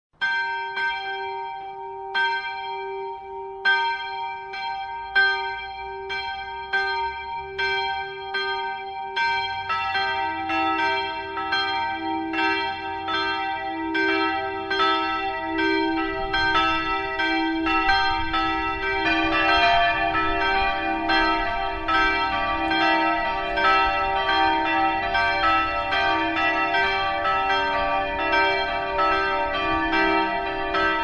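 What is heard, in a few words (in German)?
Tonaufnahme d2 e2 g2, mehrstimmige Geläute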